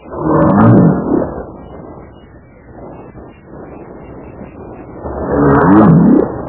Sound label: blue whale roar